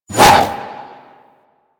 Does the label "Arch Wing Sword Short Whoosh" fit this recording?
Yes